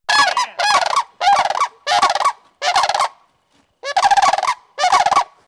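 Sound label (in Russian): kanadskiy zhuravl golos, sandhill crane voice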